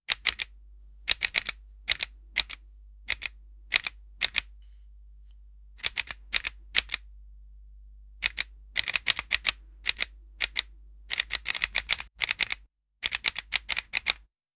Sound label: shoots from ak 47, automatic fire